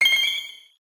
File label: Shiny sparkle; pokerogue; battle anims